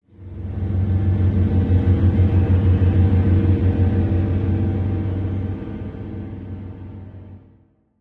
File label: Stinger; Angry Birds Star Wars 2; Crimson Stinger Dark